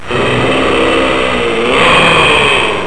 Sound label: digital groan; Sound Effects for Multimedia - Volume 1; electronic sounds